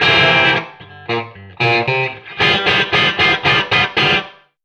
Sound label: BAR BAND, Hot Steel Blues; ILIO